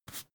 broom hand sweep short

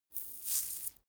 mandarin slice separate

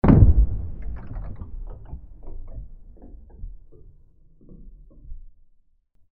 noisy impact

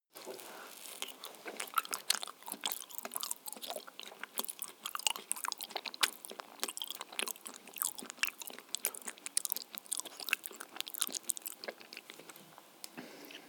male eat smack

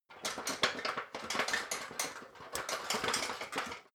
ladder metal rattling long

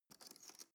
cutlery teaspoon drawer grab soft

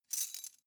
cutlery teaspoons handle short

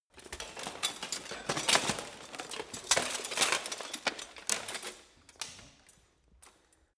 get up

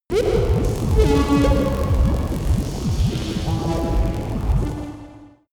space inside biomedical alien spaceship active

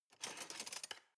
cutlery large drawer rattle soft

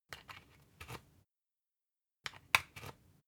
usb port metallic soft in

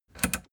plastic button latch click enable fast

